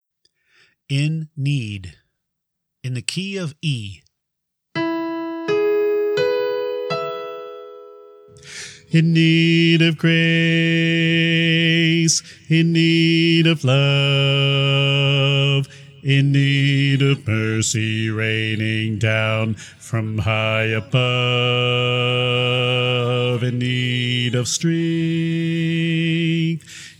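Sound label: In Need Bass, choir part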